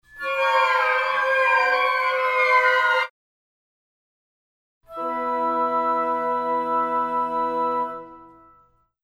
pitch bending fx und 5th chord

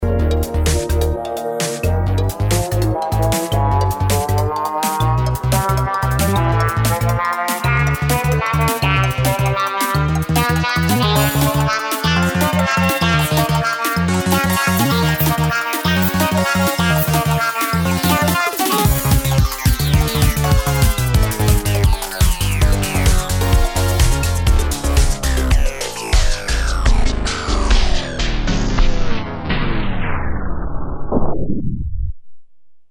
Pitch ohne

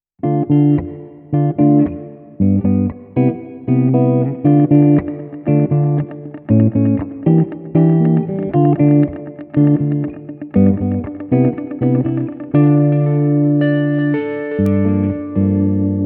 Dub auf einer Spur

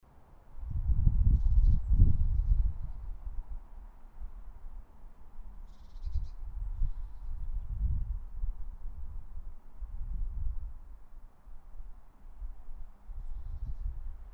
XY HPF Außenatmo ohne Windschutz; Centrance MixerFace R4B

